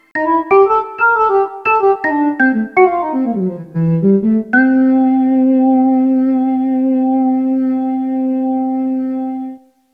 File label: Drawbar Organ